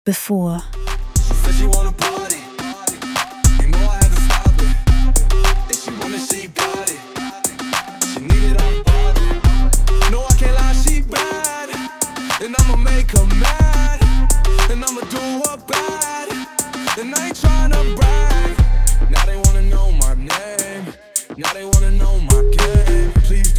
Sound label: hip hop; mixing mastering